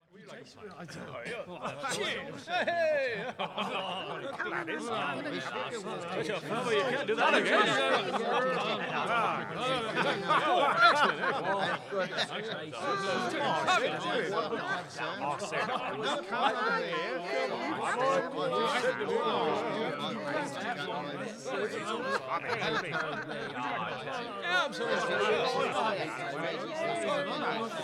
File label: Background Bar Or Pub